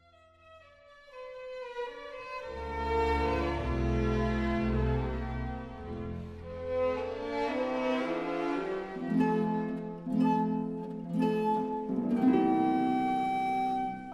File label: flute and harp concerto in c major andantino